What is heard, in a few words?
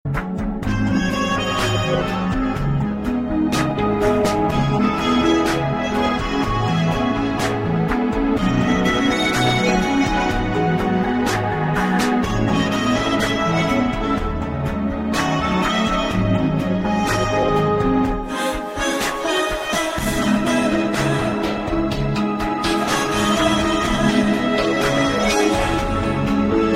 Instrumental Ringtone